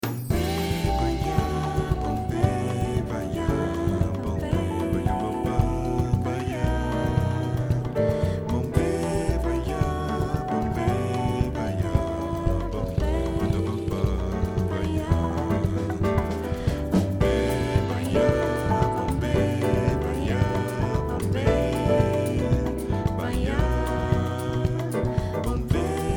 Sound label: jazz pop